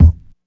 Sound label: kick; Classic Hip Hop Mega Kit